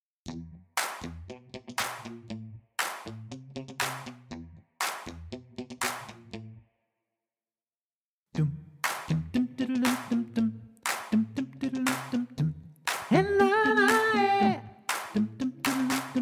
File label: vocal mix